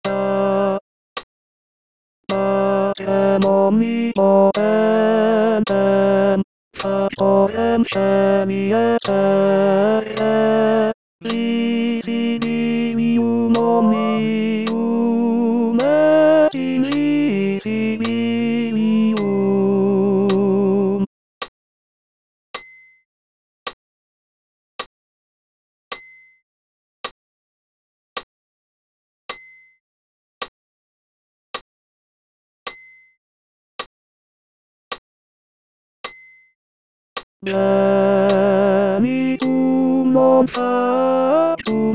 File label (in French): Credo ten voc acc